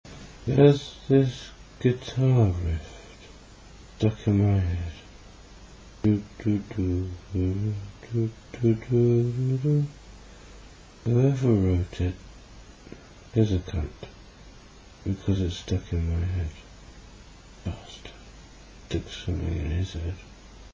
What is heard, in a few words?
guitar Riff